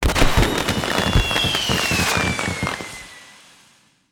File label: fireworks